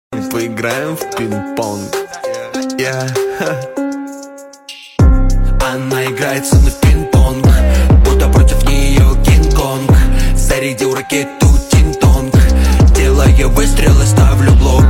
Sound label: Ping pong